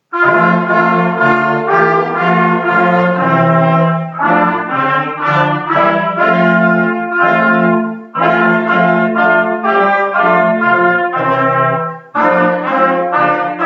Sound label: Brass Good King Wenceslas